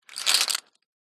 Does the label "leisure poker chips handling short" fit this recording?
Yes